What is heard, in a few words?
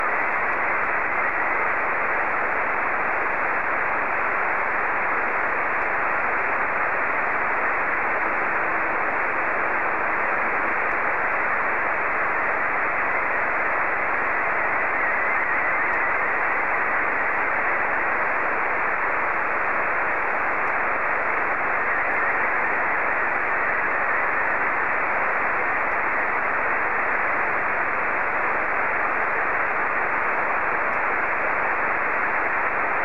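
Scottie 1, slow-scan television; VK4MSL SSTV RX @ QG62ln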